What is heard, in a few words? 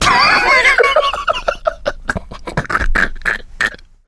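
laughter, male; survivor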